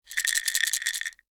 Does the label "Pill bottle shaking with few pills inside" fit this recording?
Yes